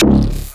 Noisy Bass Hit

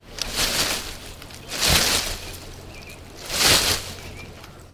Shaking Branch

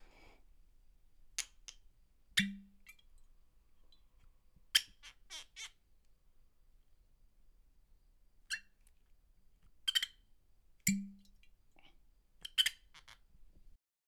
Cork

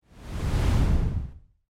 Woosh

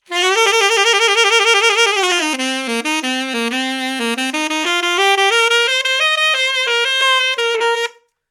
Alto Sax 90bpm Cm Solo

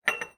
Cup And Saucer

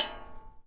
Hitting Metal Object With Metal

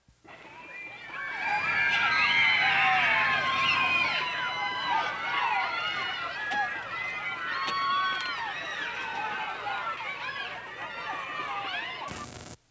Amusement Park Screams

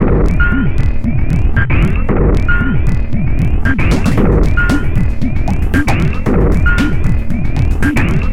115 Bpm Stab Loop

